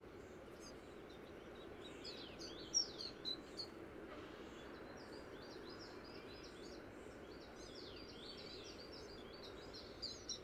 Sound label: Siberian Chiffchaff Subsong Of Very Young Fledgling